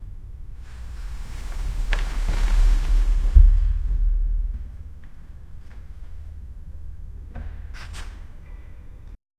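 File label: player sliding on the ground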